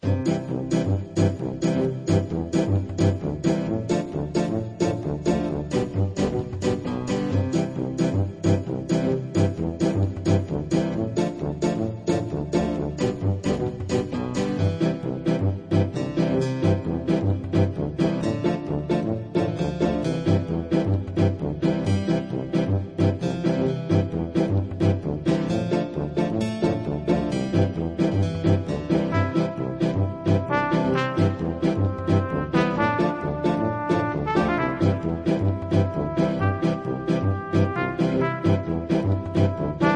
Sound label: fight bgm; 弹球音效; tanqiu